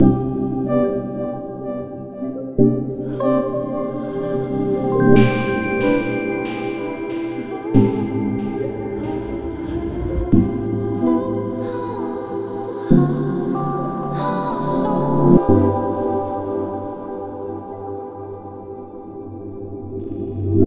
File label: Velvety Resonance; Sony; Xperia Movie Creator Music